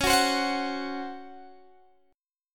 Db Major 7th Suspended 4th Db down Guitar Open G

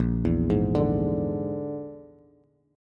A sharp 7th Flat 5th, Bass chord; arpeggiated; 6,7,6,7; JGuitar

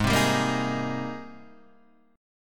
Ab Major 9th Ab, Guitar Drop D

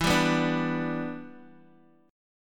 E Minor 7th, down, Guitar, DADGAD; Em7; strummed

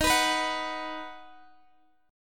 D sharp Minor Sharp 5th D sharp, Guitar DADGAD; x,x,13,16,14,16; chordshape

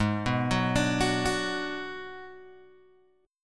Ab Minor 7th Flat 5th Ab, down, Guitar, Open G

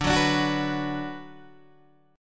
D 6th D down Guitar Open D, chord

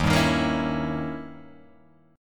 Eb 7th Flat 9th, Eb, Guitar, Open G

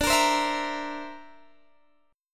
D 7th Suspended 2nd Sharp 5th

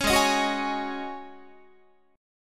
G sharp 7th Suspended 4th G sharp, Guitar; G#7sus4; 16,16,18,16,17,19; strummed

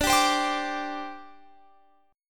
D 7th Suspended 4th D Guitar Open F; D7sus4; strummed; x,21,19,19,22,21

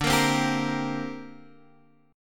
D 7th Suspended 4th Sharp 5th D

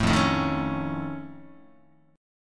F Augmented 7th F Guitar, chord; F+7 strummed; JGuitar

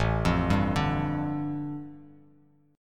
A 7th Suspended 4th Sharp 5th, A, down; A7sus4#5 arpeggiated

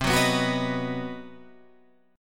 C Minor Major 7th Flat 5th chord, Guitar Drop D

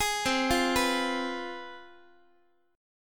C Major 7th Suspended 4th Sharp 5th, down, Guitar; CM7sus4#5 arpeggiated; x,x,11,10,9,10; JGuitar

